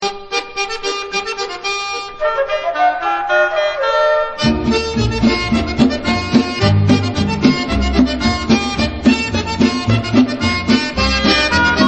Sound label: Debka Instrumental